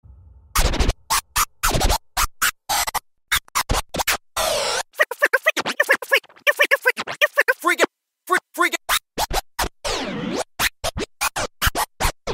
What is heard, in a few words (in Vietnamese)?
Hiệu ứng âm thanh DJ